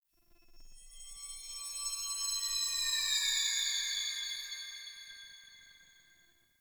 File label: Bell Weep, Ambience Interior; Warframe; Orokin